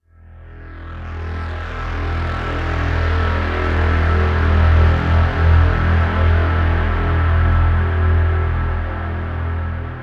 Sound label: Downtown Overlay Drone